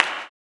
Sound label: CLAP, blues & soul; ILIO; Vocal Planet